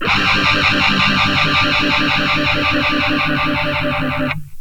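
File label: guitar hoax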